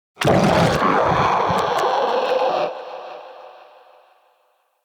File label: Shrimp Vocal Angry, Creatures; Vocals; Baliq Shrimp Glade